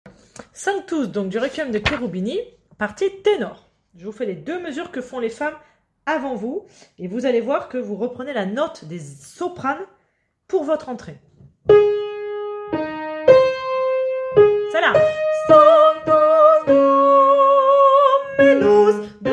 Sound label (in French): tenor